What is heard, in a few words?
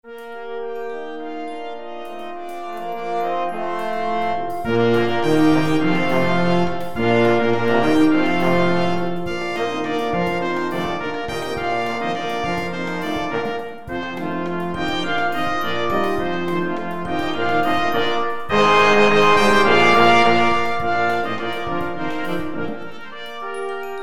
brassband